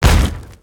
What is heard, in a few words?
tower fireball impact; npc; smite; phoenix